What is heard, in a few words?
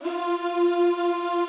F3 R, Classical Choir; Best Service; Extended Classical Choir